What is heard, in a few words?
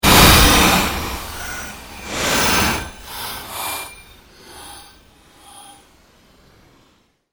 pulse long sweep, Sound effects created by manipulating other sounds; Rise And Dive; Rises and Dives